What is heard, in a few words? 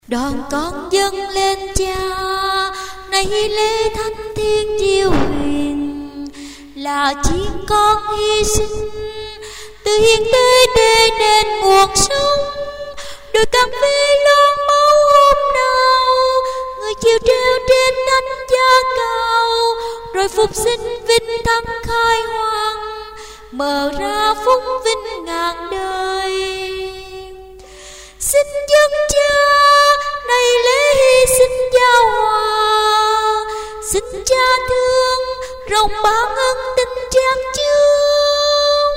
Tenor